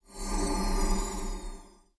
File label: Heal Low Base; zombie plague